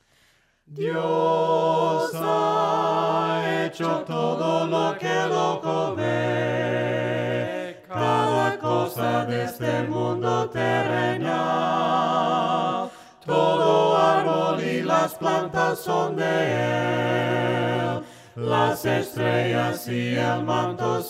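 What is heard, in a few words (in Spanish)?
VOCES, himnos a capella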